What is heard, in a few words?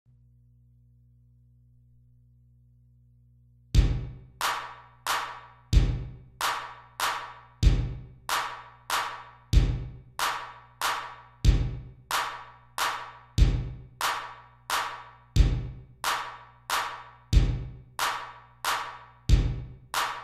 French Waltz Drums